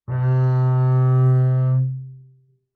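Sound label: Bass arco fortissimo sul A C3, Double Bass; MIS Pitches - 2014; Strings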